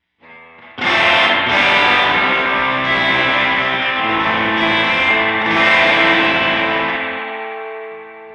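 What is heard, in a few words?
Spring Electric Guitar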